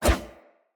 Player Short Sword Whoosh; Weapons; Swords

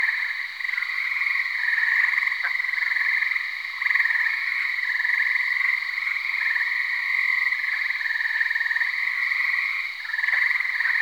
Night Frogs Loop; Ambience; Props; Warframe; Eidolon Plains

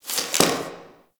Box Of Trash Rustle

